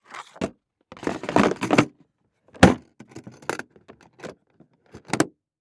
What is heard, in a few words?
Industry Tool Box Put In Tray Close Lid